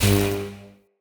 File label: Ambient Tesla Rod Zap; Encounters; Ode Pollution Tower